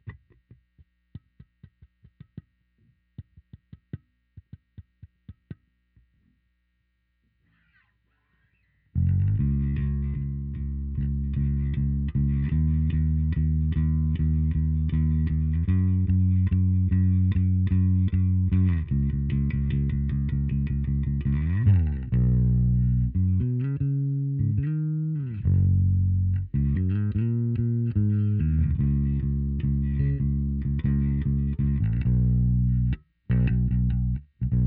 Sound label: new Bass